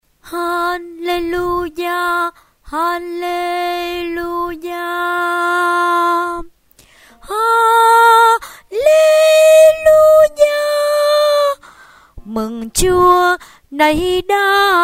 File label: tenor